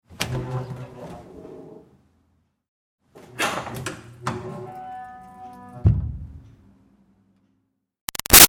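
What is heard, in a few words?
Door Wood Push Bathroom Groan open-close Fast; Miscellaneous Doors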